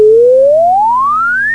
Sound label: SINE UP